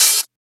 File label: Open Hat; FLPRO